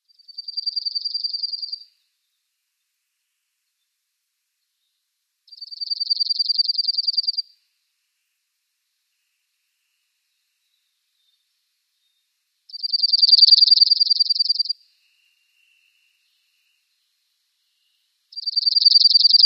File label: Dark-eyed Junco song